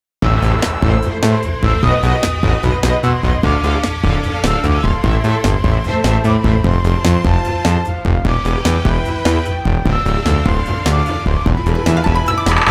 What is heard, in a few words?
(Synth Pop); Wonder Boy The Dragon's Trap 2017